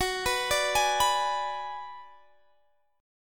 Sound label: Ab Minor 7th Flat 5th Gb